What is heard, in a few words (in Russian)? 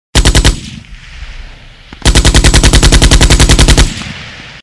pulimet; zvuki sms